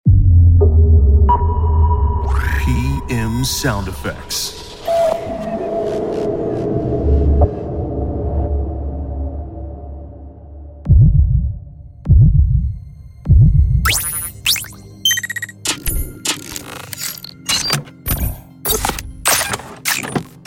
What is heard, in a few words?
Forgotten Neons; PMSFX